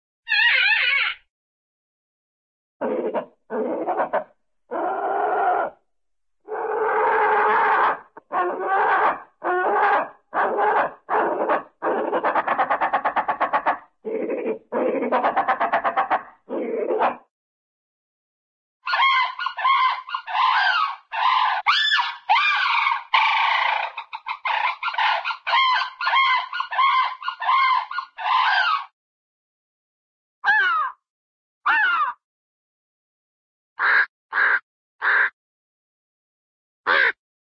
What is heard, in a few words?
bird, cockatoo squeaky squawk, animal; cartoon